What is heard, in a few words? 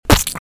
splat